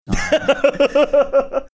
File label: cute laugh